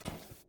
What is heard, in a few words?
SFX Footstep metal; this is the zodiac speaking 2020 gamerip switch ps4 xbox one pc macos